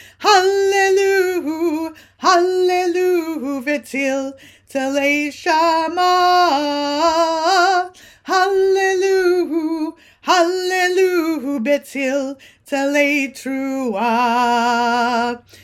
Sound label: Psalm 150 Verse 2; Kulanu Joint Saturday Morning Service; Shabbat